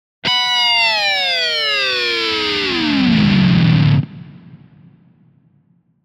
Rock Guitar Distorted FX - Going Down Reef - Double; Hollywood Edge; sound designer's toolkit v2